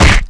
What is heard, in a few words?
MOB BATTLE WOODSTICK, Battle Mob Weapon